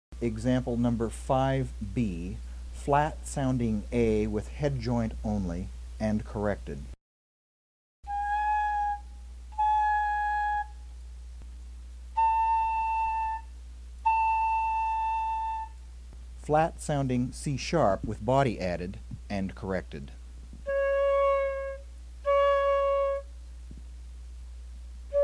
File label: Embou FL, Flute; FLAT SOUNDING; Headjoint rolled “in” too far; hole covered too much.